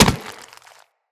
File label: Infested Runner Step Default, Footsteps